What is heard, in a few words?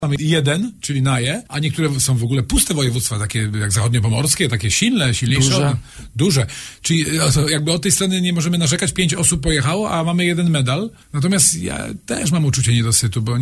flugel